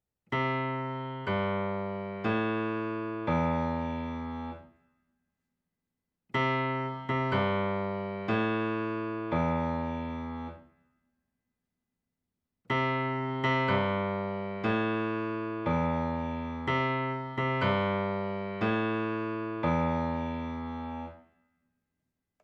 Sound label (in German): ritterliches Glocken